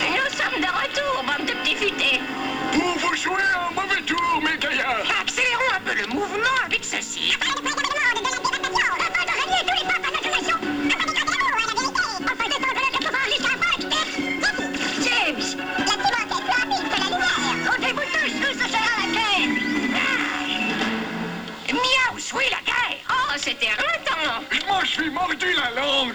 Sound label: Motto accelere